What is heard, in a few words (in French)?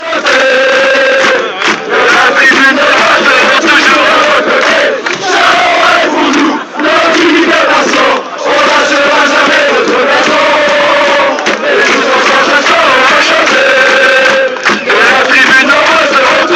et tous ensemble ce soir on va chanter